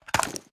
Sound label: ar reload maghit; weapons; akilo47; viper